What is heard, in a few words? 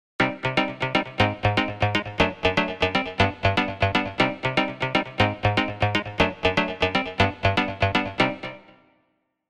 Like techno synth minor